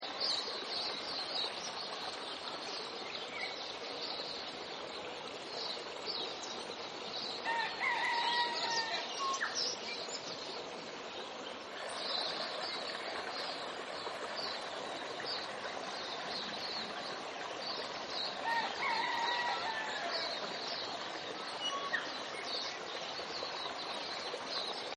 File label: Pastoral Ambience; Nature Scenes; Environmental